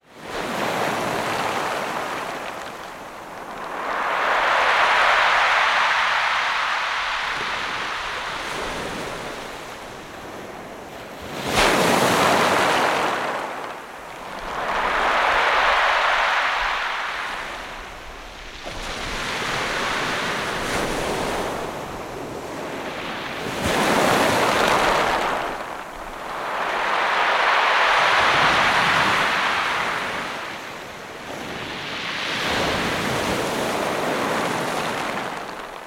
water ocean med waves crash onto stoney beach stones pulled out